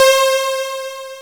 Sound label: Q F-SY WAV H